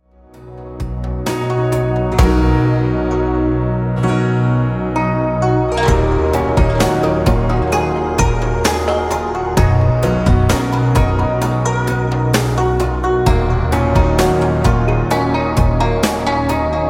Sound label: Relaxing